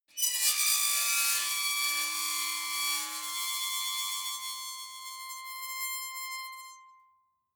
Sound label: metal squeal bright; metall